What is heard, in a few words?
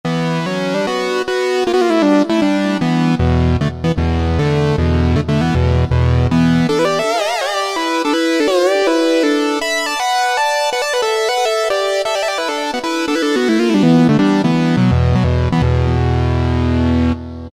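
Lead Quick 5ths, Yamaha EX5R; Factory presets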